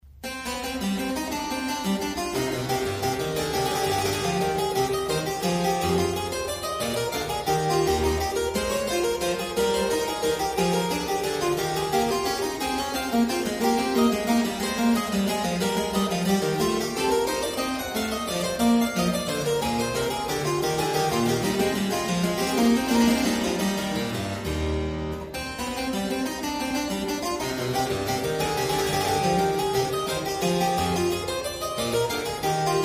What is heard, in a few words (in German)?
kleine Praludien fur Anfanger auf dem Klavier in D minor; BWV935